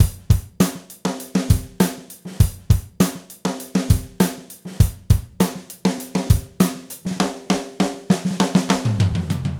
Gretsch groove 90 BPM, 1967 Gretsch Kit drums; Sonic Foundry (Sony Creative Software); Vital Drums

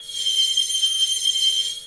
SLICE SCAN; ENVIRN-AMB

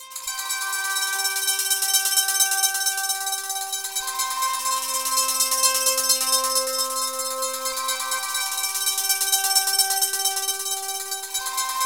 TWANG; Zero G; Malice In Wonderland

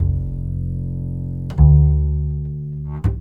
BASS, 150 BPM; Ueberschall; Drum & Bass Flash Trackz

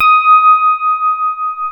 DX ROADZ SFT, FM Paired Tines; E-MU Producer Series Vol. 2 – More Studio Essentials; Keyboards

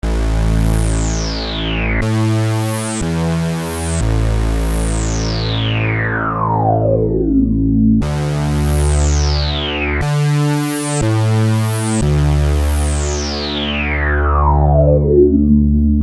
Syn Bass 120 A, Techno Synth Loops; Sonic Foundry (Sony Creative Software); Signals I

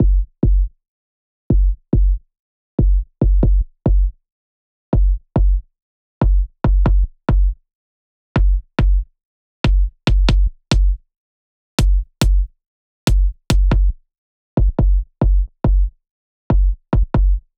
140 BPM Intro Break Drums Kick; Vengeance - Essential Clubsounds Vol.5; VEC5 140 BPM Vengeance Intro Kit Root E; Drums & Perc

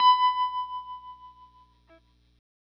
GRTCH TREM B4; Sonic Reality; American Heartland

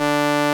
SOLINA, String Machines

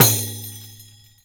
RIQ, ETHNIC PERC; Instruments & Percussions; InVision Interactive; Lightware VOL-1

